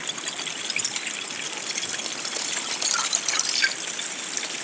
Icy Stream, On The Glacier

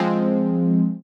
Fm Dub Chord

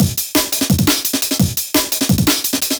Stomp Pitched, Loops, breaks; M8

